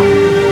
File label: Second Drone; JMSL Score Samples; Die Young Drones